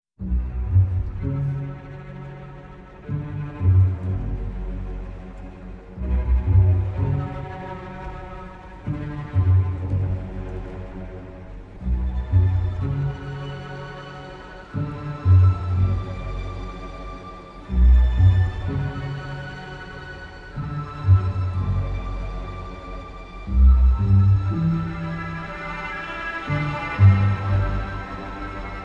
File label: Humming Amidst Rocks; Genshin Impact